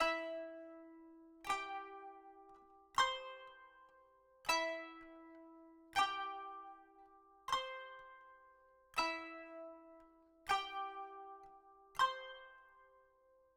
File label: kanun